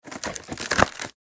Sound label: Wicker basket lid lift and open